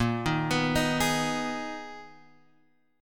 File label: Ab Minor Bb, Abm/Bb chord; Abm/Bb arpeggiated; JGuitar